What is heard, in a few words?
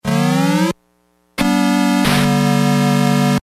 SMS; 44Khz stereo 16bit